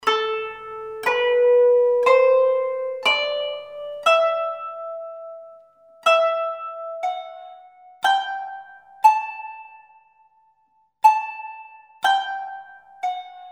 kanun hüseyni muhayyer gülizar

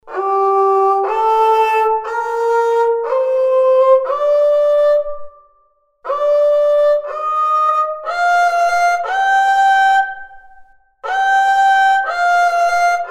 kemençe nihavend

